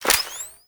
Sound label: Mode Switch To Single, Pistol; Grineer; Amphis; Weapons